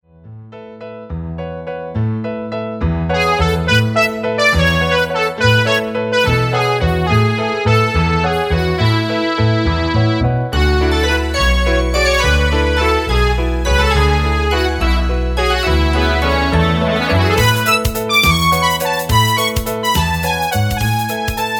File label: waltz